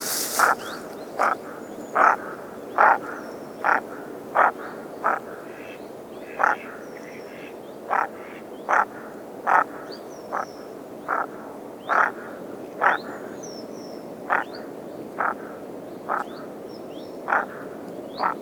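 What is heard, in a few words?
goosander voice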